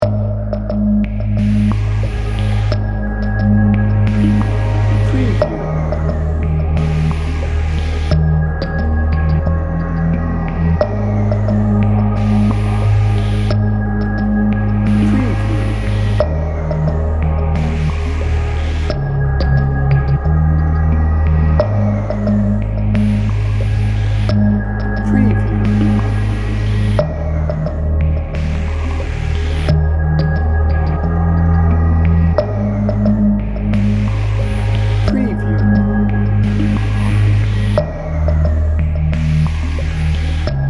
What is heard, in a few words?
after the fall dnb